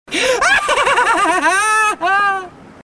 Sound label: laugh